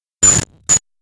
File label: video glitch